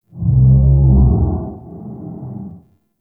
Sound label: Vocal Groan; Ambience; Bromius Inside